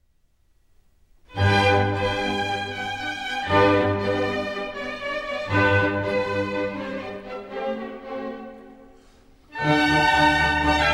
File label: Violin Concerto No. 3 in G Major; K. 216; Mozart